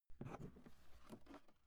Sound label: briefcase open